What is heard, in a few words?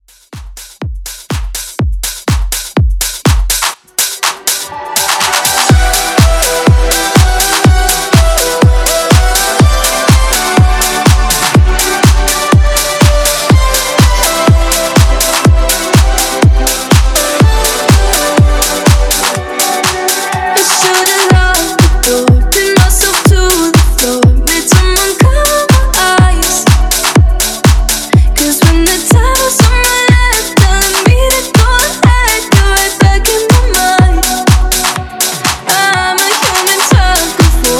Dance 123 BPM